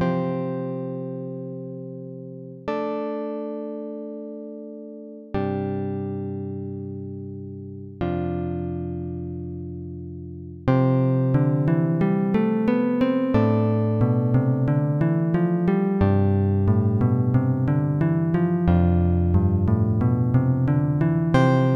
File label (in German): Menü Musik; Soundtrack